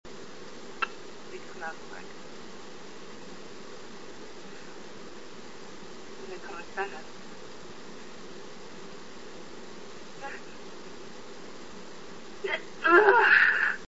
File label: stuck sneeze, female